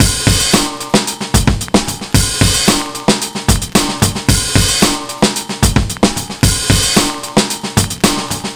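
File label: drum, 112bpm, Breakbeat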